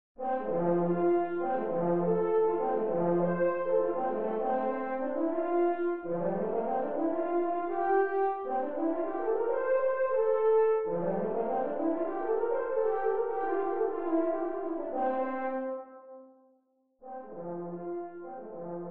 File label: Horn Track